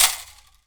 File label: PU IL, HAWAII PERC; AKAI S6000 CD-ROM; WorldSounds